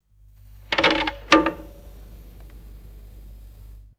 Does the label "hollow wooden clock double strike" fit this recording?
Yes